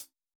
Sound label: hihat, Acoustic